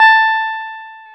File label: Jazz Guitar; Club-50 - Foundations; Jaz-Kessel